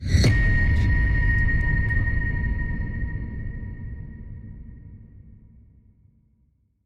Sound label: cinematic rise hit; soundscrate